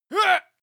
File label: male attack shout; schreie; menschen